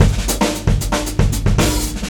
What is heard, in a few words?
113, OFFMIKE DRUMS